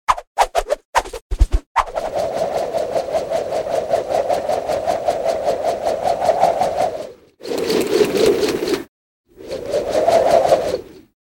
Nunchaki

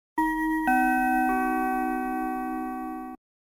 Nachalo seansa predstavleniya signal

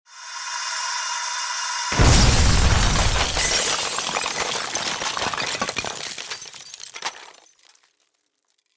Vzryv gaza

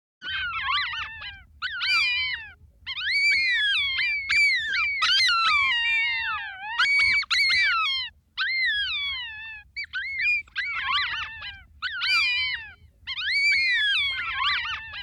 SHakaly

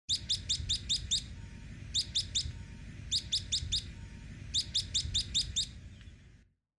Peschanka

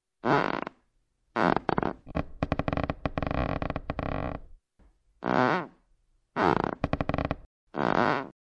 Pol parket skripit

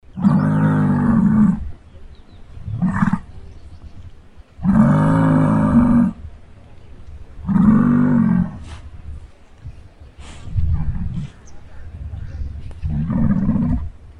Ovccebyk

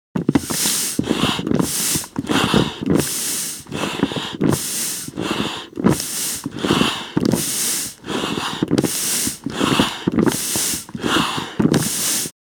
Meha nagnetajut vozduh

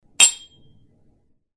Rjumki

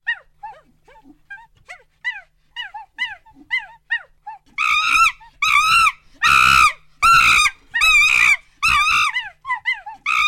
Obezyanki

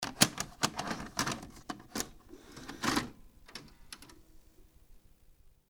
Videokassetu vstavlyajut

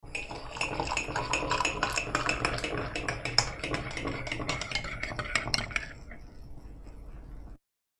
Zavarivajut kofe

